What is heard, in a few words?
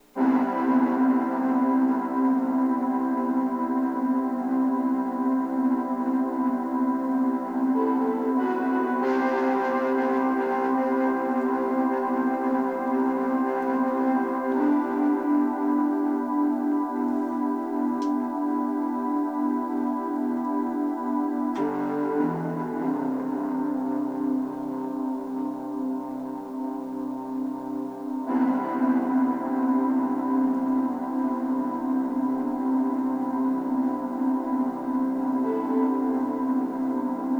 Ambience; Ambience for April